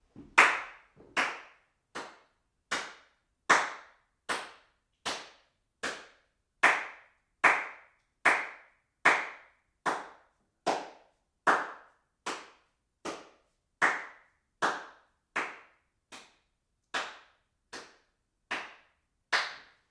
hand claps far

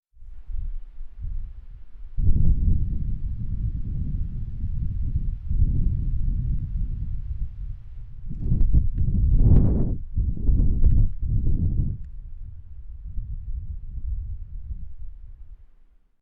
wind on video camera mic

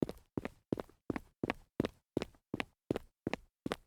dress shoe jog on concrete

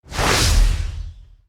swoosh

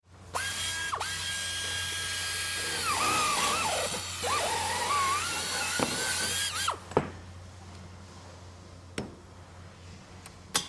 drilling at construction site

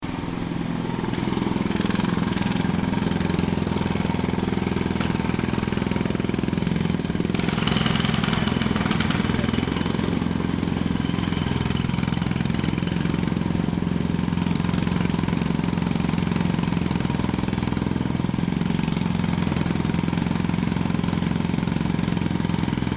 jackhammer close

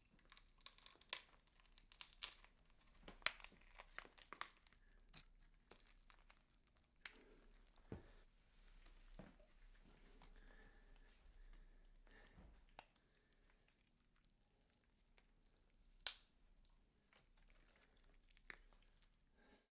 pulling bone cracks

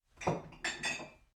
kitchen noises

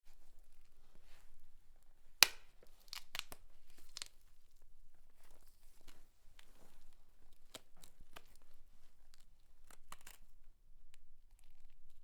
leg bone break

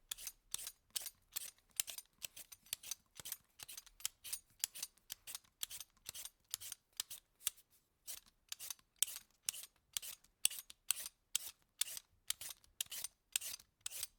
peeling food with peeler

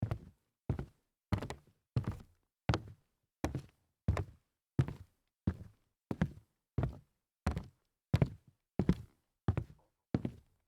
walk hollow wood

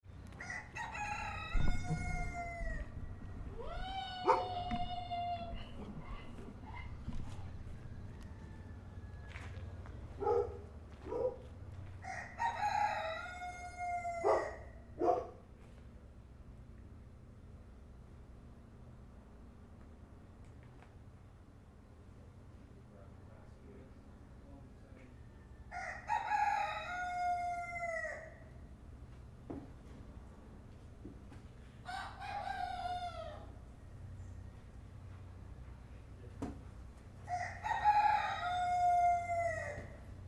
barnyard with animals

